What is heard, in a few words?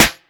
airy sizzle acoustic snare sound e key; snare drum samples